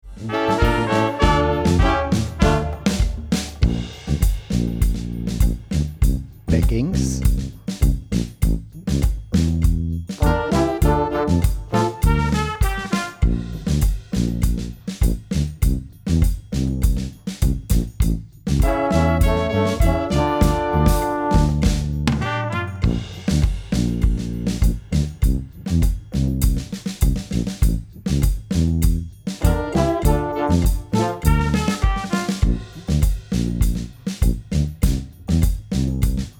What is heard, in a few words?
Solo, brass